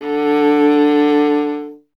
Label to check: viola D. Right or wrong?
Right